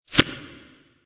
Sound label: defeat arrow pull; smite; chiron